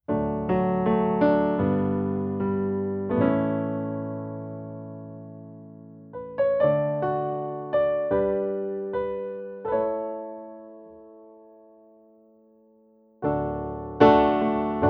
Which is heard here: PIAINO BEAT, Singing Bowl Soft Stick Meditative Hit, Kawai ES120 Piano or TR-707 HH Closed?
Kawai ES120 Piano